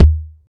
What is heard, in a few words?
processed kick; Zero-G Deep House; single hits